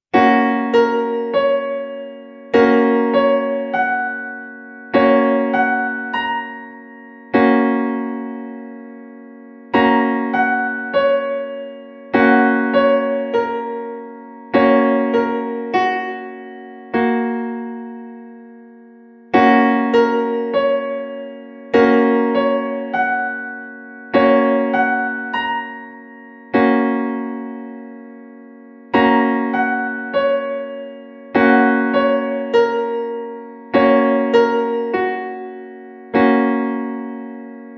Fis Gb Piano Keyboard Chord

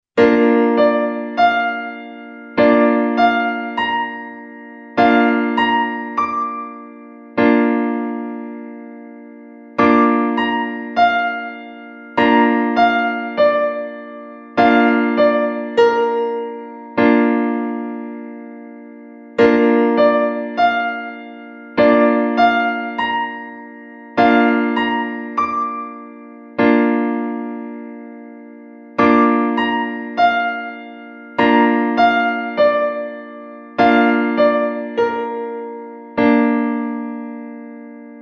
Ais Bb Piano Keyboard Chord

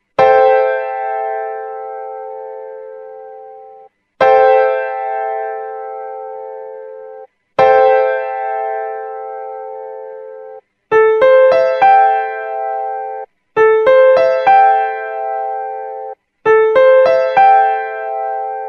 Am7 Piano Chord

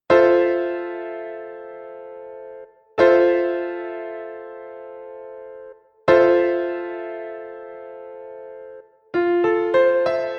Fmaj7 Piano Chord

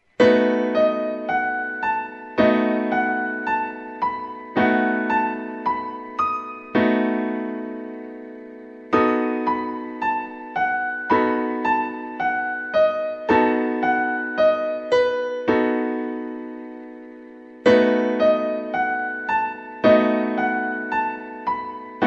B7 H7 Piano Chord

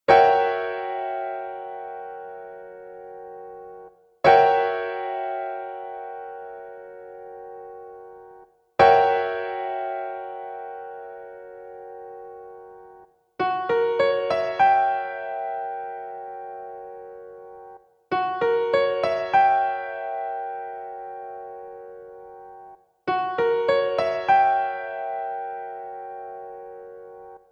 Fisb9 Guitar Chord

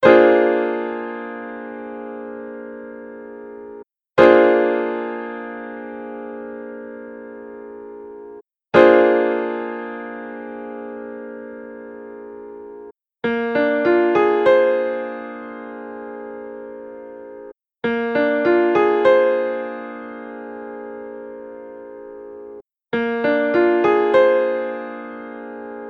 Bb9 Piano Chord

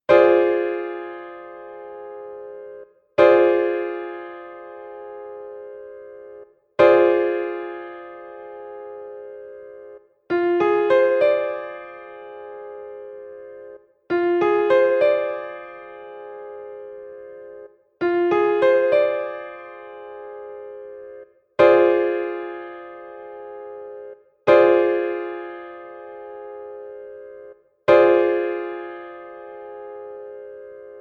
Fm6 Piano Chord

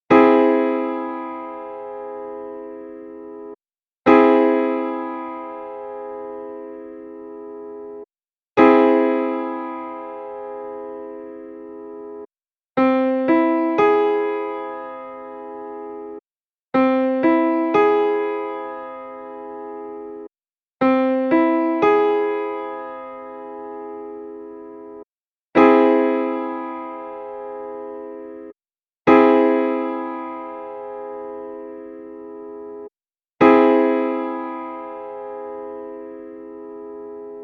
C aug Piano Chord

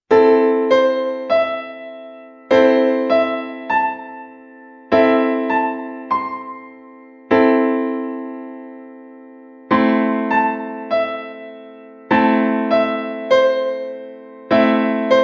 Am Piano Keyboard Chord

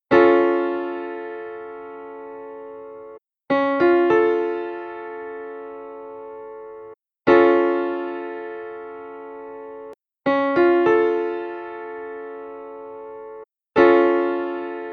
Dbaug Piano chord

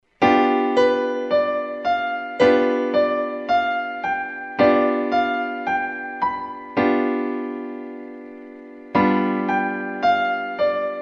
G7 Piano Chord

